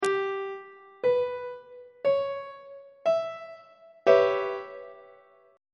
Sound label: d flat half diminished 7th 2nd inversion; on treble clef